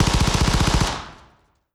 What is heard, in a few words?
ger jet fire; Hearts of Iron IV Death or Dishonor